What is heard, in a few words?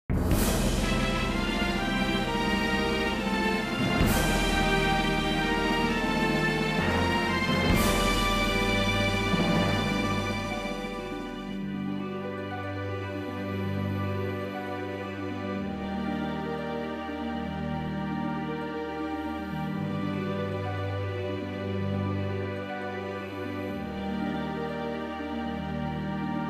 Victory Song; Mimana Iyar Chronicle; psp